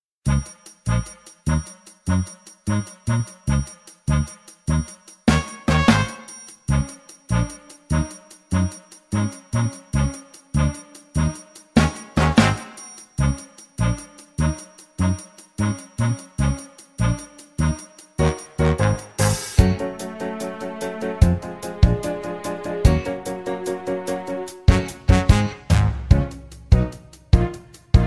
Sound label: Backing